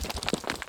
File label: crumble